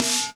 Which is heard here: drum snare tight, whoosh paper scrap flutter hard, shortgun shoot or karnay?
drum snare tight